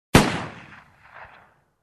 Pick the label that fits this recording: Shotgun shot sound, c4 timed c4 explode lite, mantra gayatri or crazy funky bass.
Shotgun shot sound